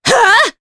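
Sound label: Vox Attack Japanese; Maria; kings raid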